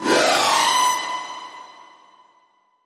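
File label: Alarm Future Police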